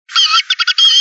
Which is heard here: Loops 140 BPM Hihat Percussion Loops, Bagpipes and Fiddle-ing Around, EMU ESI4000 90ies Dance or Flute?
Flute